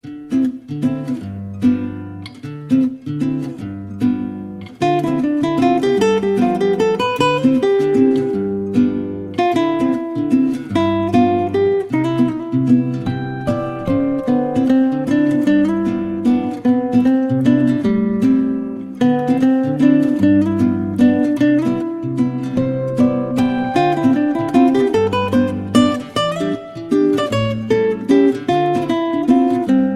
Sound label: Samsung ringtone; samsung-ringtones